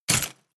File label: step armor halfplate